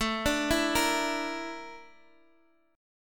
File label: Bb Major 7th Flat 5th A